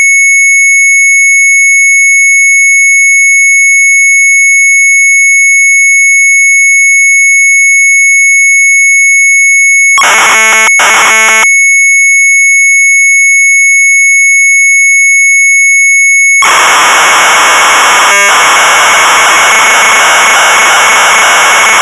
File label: Sharp MZ800 Tape; PK DATAMAKER; retromelsarchive